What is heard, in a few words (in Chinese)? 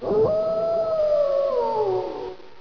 狼; 动物; 兽类; 特酷声音素材库